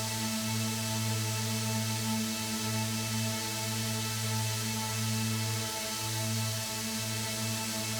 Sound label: Drone; stereo toolkit samples; Non Tempo Loops